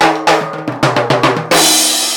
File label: 110 HipHop Timbales; Percussion